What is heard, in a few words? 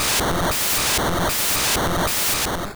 Rain Dance